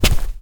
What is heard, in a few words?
Rug Run; moul